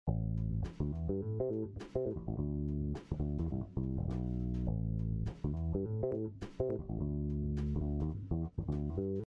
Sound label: music